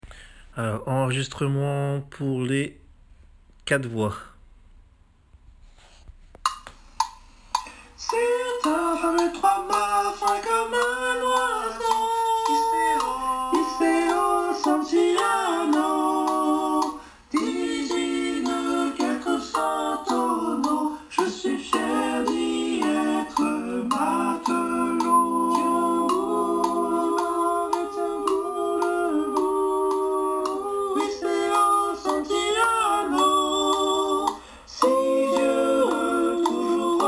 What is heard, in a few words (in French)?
Santiano ensemble